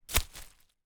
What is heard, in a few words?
fall On Grass